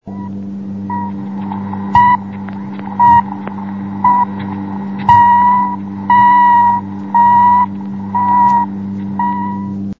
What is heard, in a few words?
Lunik 3 beeps double speed